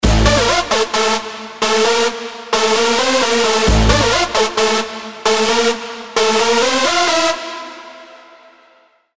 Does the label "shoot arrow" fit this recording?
No